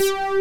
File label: PHAT SYN BS, DANCE; Pro-Rec - Synthsonic; Z1 DANCE